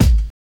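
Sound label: BASS DRUM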